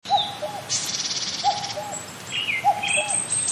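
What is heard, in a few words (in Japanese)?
kakko, cuckoo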